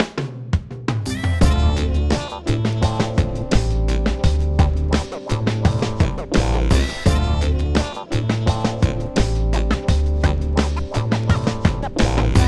Scoreboard; The Jackbox Party Pack 5; Jackbox Games

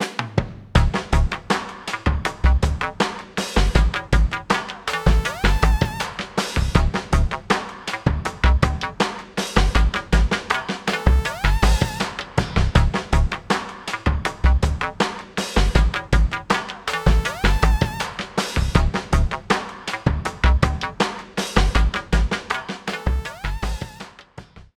Rap Loop; The Jackbox Party Pack 5; Jackbox Games

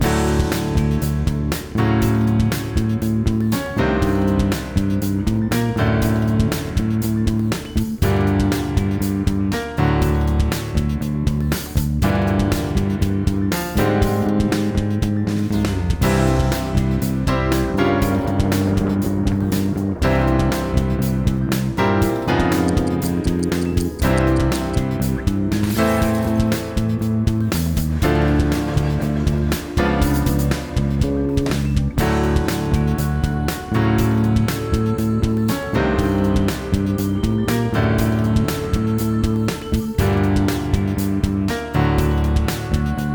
Theme (alt); Split the Room; The Jackbox Party Pack 5; Jackbox Games

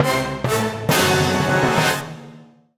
Loser Wheel Dun Dun Dun; Jackbox Games; The Jackbox Party Pack 3

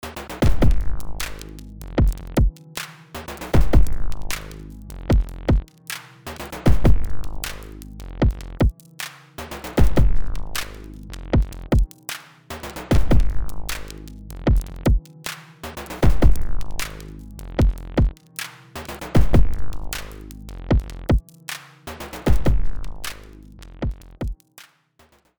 Rap Loop; The Jackbox Party Pack 5; Jackbox Games

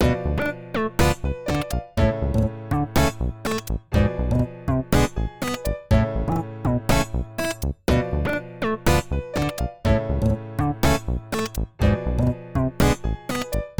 Scoreboard; DICT; The Jackbox Party Pack 6; Jackbox Games

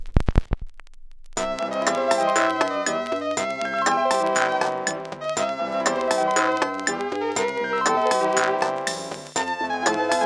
Unused Question Music; Jackbox Games; The Jackbox Party Pack 3

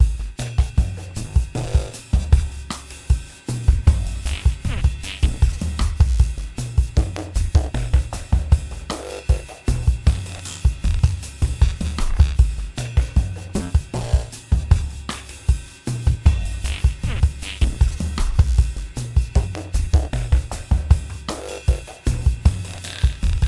Background Loop

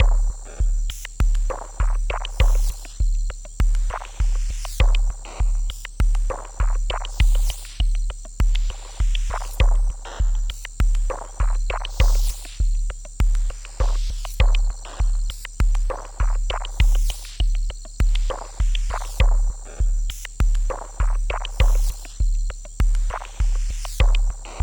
Background Loop; Jackbox Games; The Jackbox Party Pack 1; LIE SWATTER

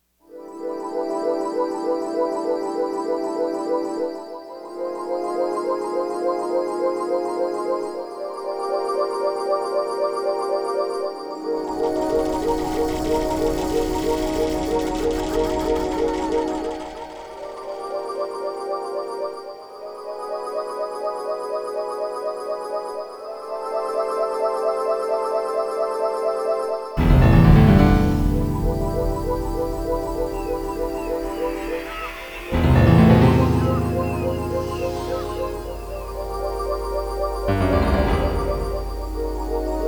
Lobby; Split the Room; The Jackbox Party Pack 5; Jackbox Games

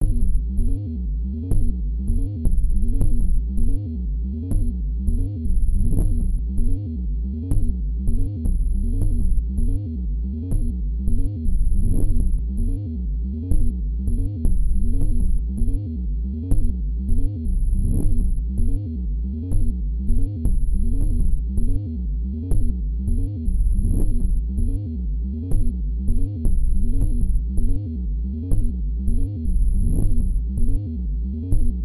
Background Loop; Jackbox Games; The Jackbox Party Pack 1; WORDSPUD

